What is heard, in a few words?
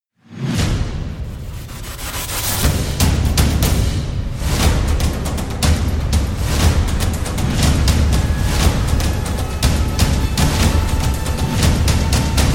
trailer backends gun it